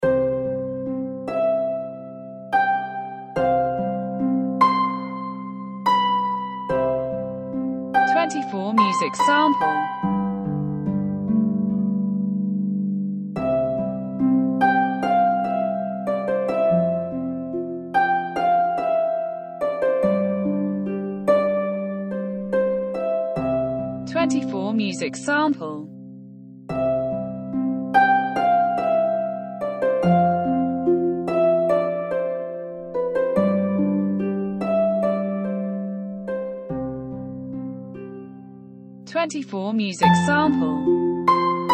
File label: harp